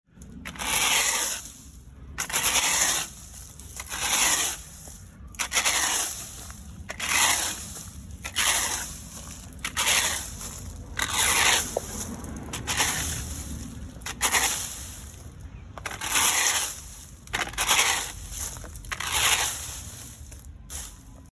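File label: rake plastic asphalt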